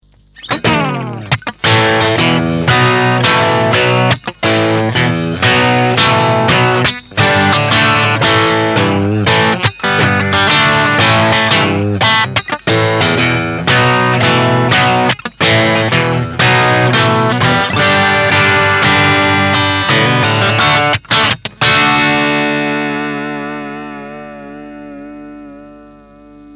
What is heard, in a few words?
Crunch